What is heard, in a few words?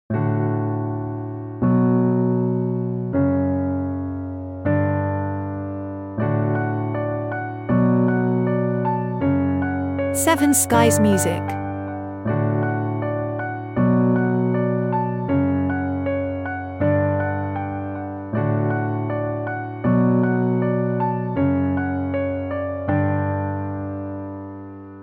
solo piano